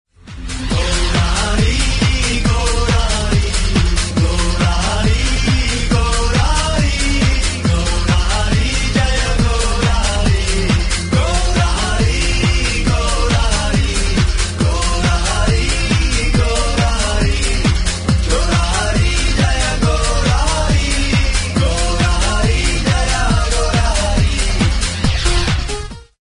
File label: Techno